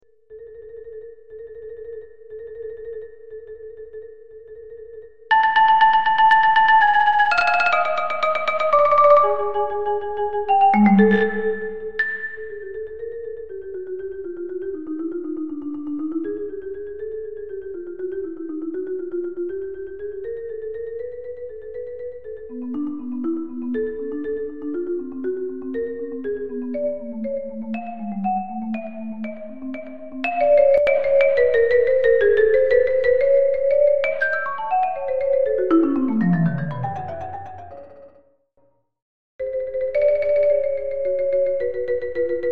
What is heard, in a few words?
Impromptu for marimba solo; Duration: / 7'; Editions Bim